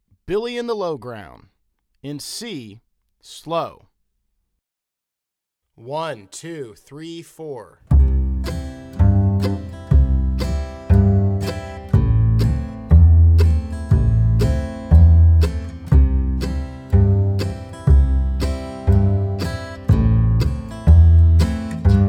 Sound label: Billy Lowground C Slow